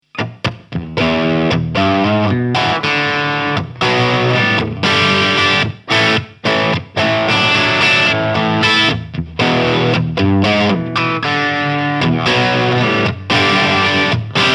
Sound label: Rickenbacker 350V63 Crunch Bridge Pickup